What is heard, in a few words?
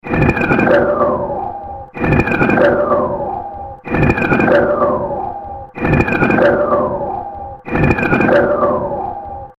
Drilling For Space Oil Loop, sci fi music; science fiction sound effect; star wars / trek style games